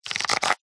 camera dslr shutter speed dial single turn canon eos 6d; technology; zapsplat